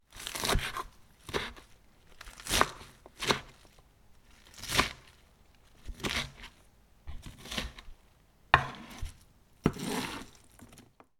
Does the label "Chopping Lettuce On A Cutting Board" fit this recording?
Yes